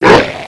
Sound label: attack poison; zod